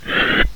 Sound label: AIR